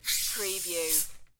Soda Water, Twist Open Cap, Carbonated Drink Cap Fizzes